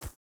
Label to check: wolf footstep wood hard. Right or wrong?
Right